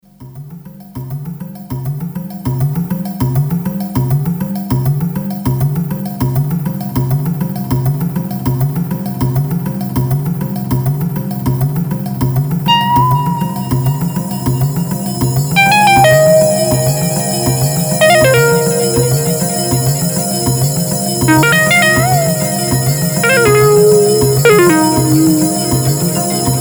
Eventide H3000 Circles Synth Drums

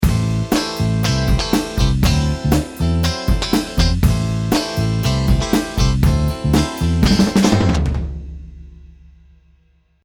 Combo dry

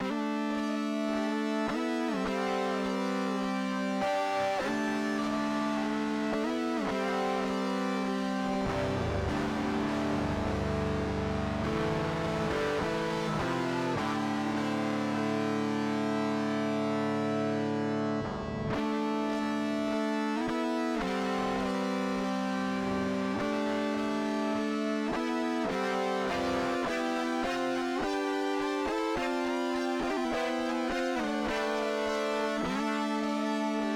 Eventide H9 Dark Pitch Fuzz

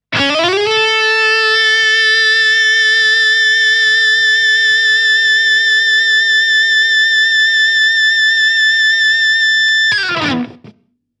Schecter Sustainiac

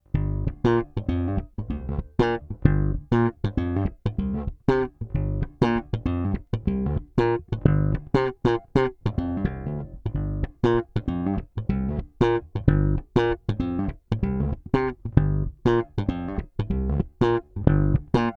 slap

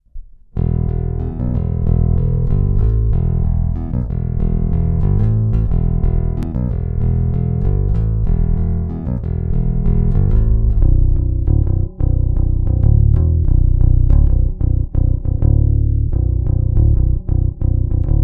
Neck Pick

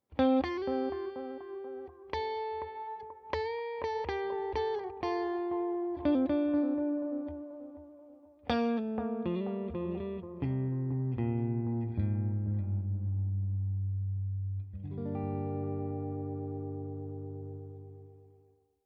Dyn Trem; Nocturnal

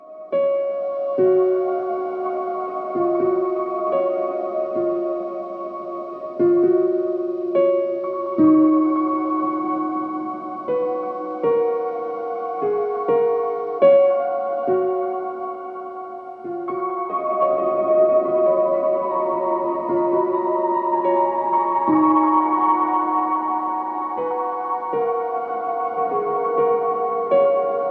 Microcosm Ambient Soundscape piano; Hologram Electronics

